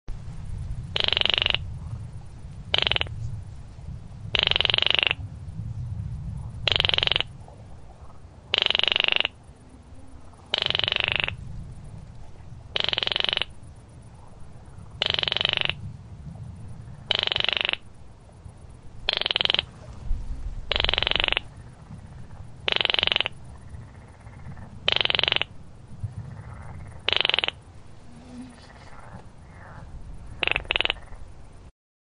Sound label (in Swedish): Dvärgsumphöna; Baillon´s Crake; Porzana pusilla; Lars Krogh